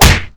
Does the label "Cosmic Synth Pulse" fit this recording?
No